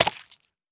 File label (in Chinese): 海豚进食声